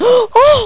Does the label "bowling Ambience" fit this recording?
No